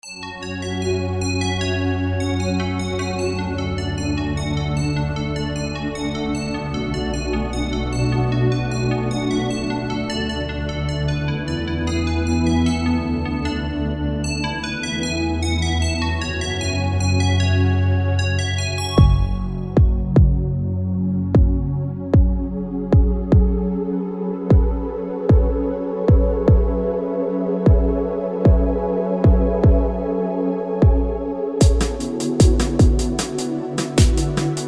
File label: Romantic Rington